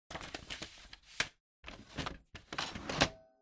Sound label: Printer Cassette Tray Close